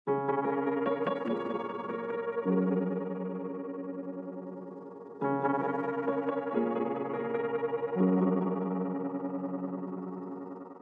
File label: Freq Echo Piano Sample